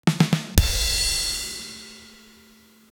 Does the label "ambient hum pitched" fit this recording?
No